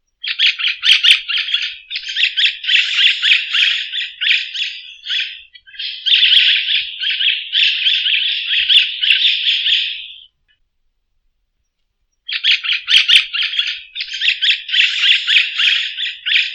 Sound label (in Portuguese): Canto da maitaca verde